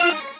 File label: normal hit normal